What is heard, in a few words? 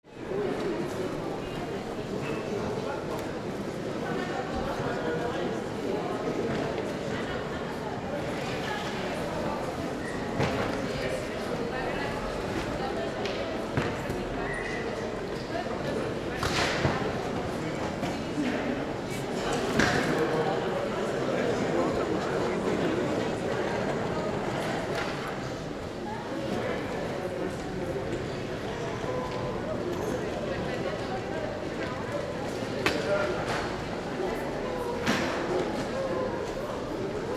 Airport ambience voices boarding Bogota airport